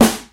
studio snare sample a sharp key; snare drum samples

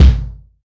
solid kick drum sample a key; kick drum samples

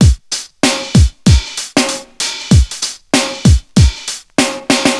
96 bpm breakbeat sample c sharp key

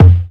low tone kickdrum sample f key; kick drum samples

